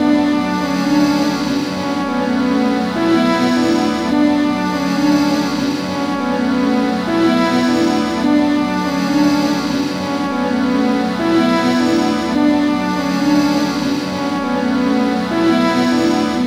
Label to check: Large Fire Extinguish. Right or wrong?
Wrong